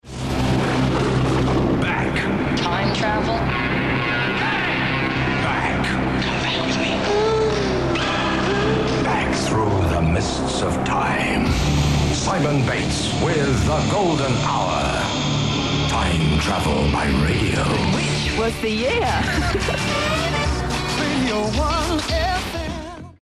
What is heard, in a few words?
jingle; s bates